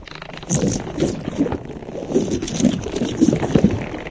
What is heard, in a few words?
barnacle digesting; Source Engine; video game; npc